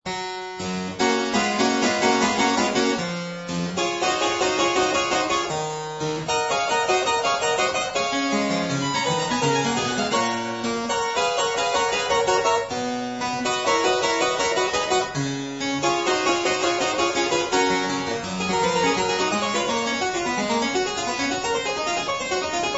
Variatio 26 a 2 Clav.

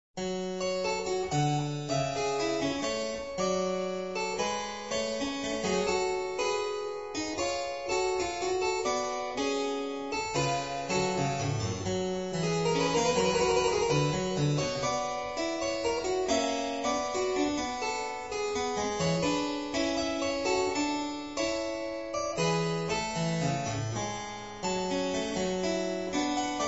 Sinfonia No. 11 in G Minor BWV 797

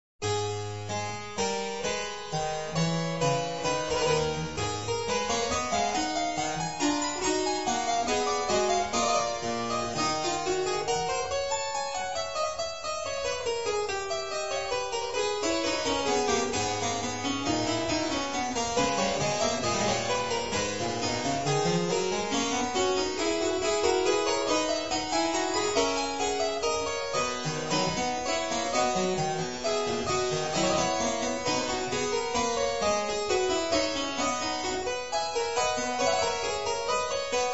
Sinfonia No. 13 in A Minor BWV 799

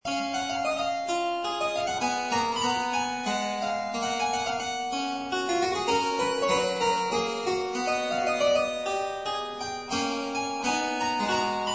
Variatio 26 a 2 Clav.

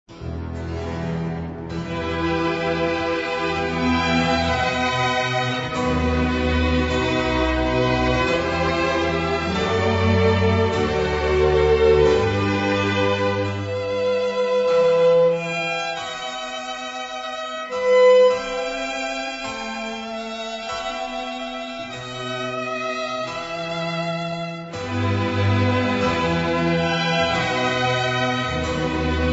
Larghetto

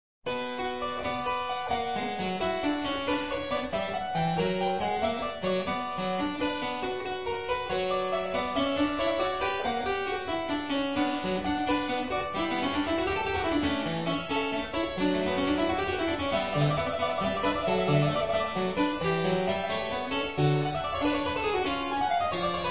Sinfonia No. 2 in C Minor BWV 788

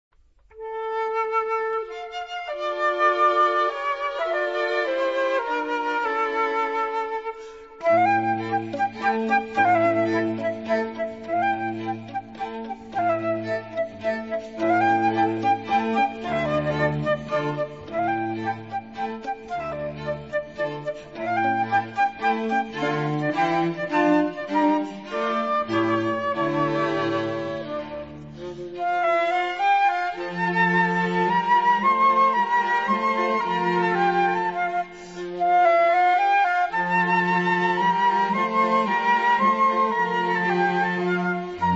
Larghetto

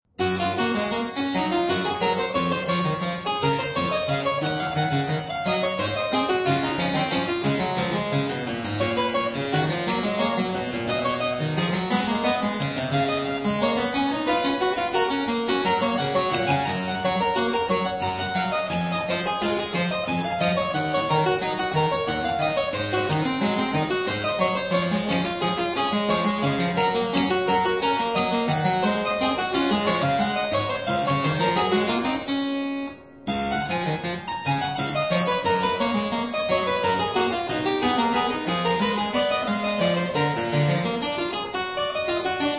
Variatio 26 a 2 Clav.

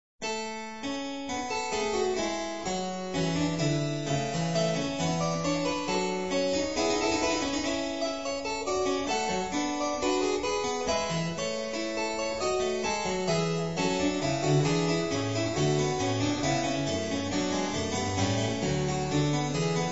Sinfonia No. 14 in B flat Major BWV 800

